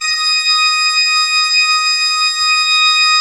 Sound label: D5, Cathedral Organ, MANUAL PLENO; Propeller Island